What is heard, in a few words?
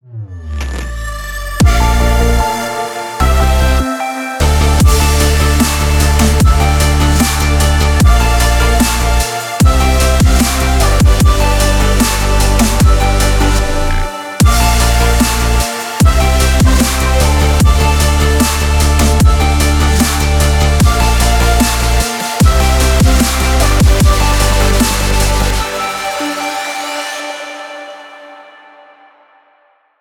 intense sporting inspiration